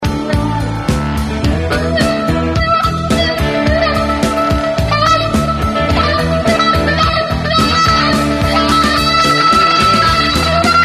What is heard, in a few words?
Electrical Guitar; Instrumentos Musicais